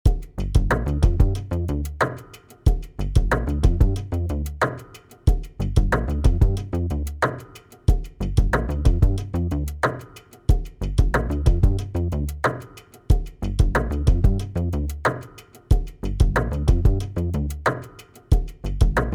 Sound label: Song Funky; Minotaurus